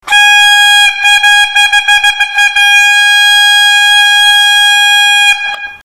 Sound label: horn